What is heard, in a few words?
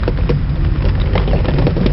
gravel; Vehicles